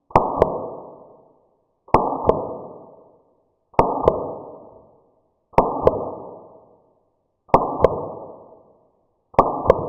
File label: Air Nailer, Bascically this a Air-nailer.